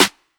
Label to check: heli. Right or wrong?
Wrong